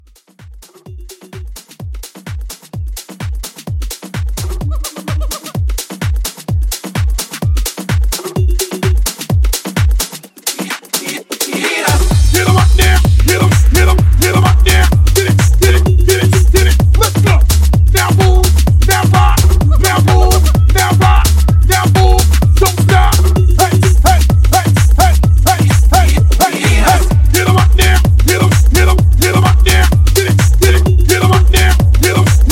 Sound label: House 128